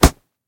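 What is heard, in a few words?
clear punch to the face with a fist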